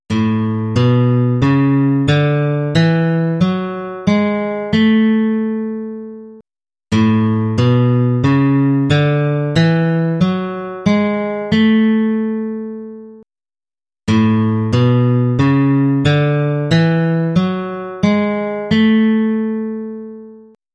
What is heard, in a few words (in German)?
A-Dur-Tonleiter, Gitarre